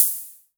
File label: Hi Hats Open; LIMAT Div Dept